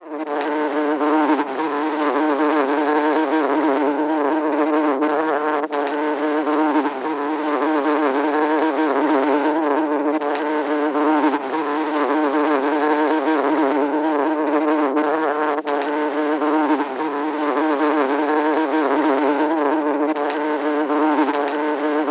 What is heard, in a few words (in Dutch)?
vlieg